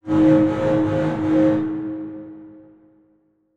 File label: Title Transition Feedback Pulse; Production Element